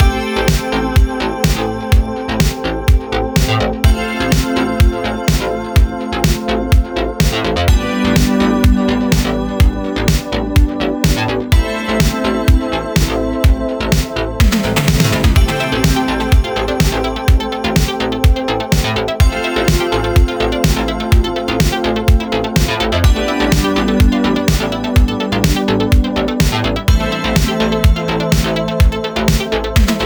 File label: Waveshaper, Horn Battle 125bpm Loop